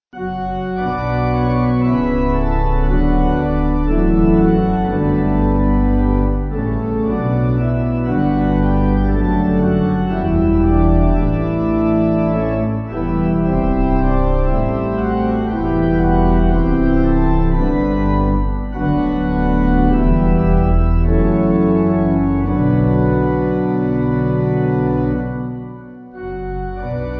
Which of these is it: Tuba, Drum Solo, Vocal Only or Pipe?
Pipe